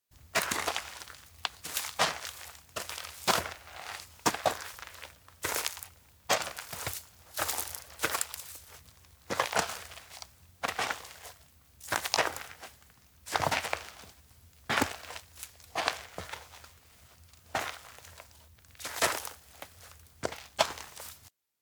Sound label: steps outdoor mountain road walk; awayuki; anemoi